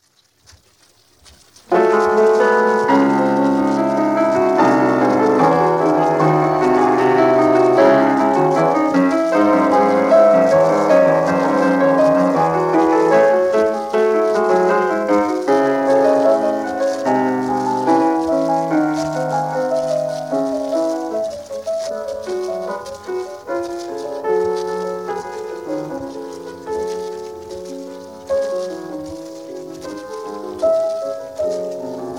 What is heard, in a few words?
Bach WTC I c fugue